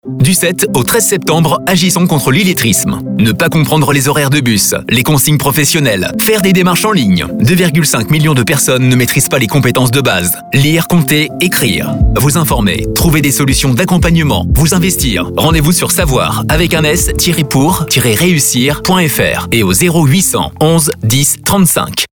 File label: MUSIC SPOT; SAVOIR POUR REUSSIR